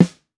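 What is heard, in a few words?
Black Brass Rimshot, Snares; Drums; Ghosthack - Free Snares 2023; Blk Brass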